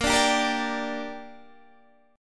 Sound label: Bb 6th Bb; strummed; 18,17,17,15,15,15